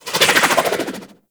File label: SMG Projectile By B; Tenno; Sundial